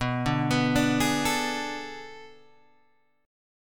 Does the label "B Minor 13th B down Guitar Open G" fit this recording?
Yes